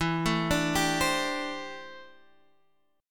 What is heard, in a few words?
D 7th Suspended 2nd Suspended 4th E